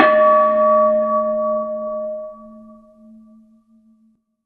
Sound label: Bell; Warframe; Dojo Props; Bells